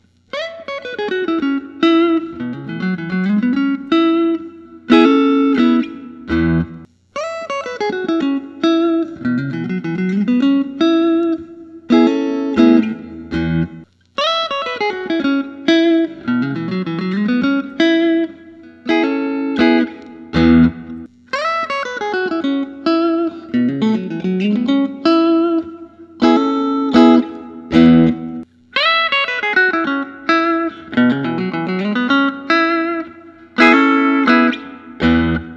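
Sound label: signature limited rose mist metallic, guitar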